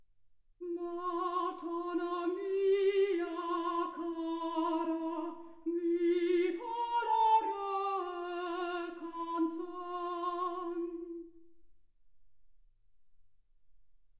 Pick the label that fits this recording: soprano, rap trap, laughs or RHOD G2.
soprano